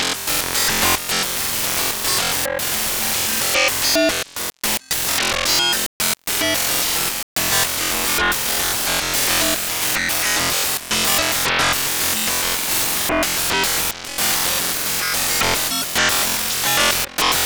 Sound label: Poly Grid, bass